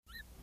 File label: mousehop; Mouse Squeak